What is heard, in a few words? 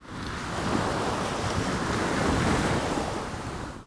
ambient battle; World Of Warships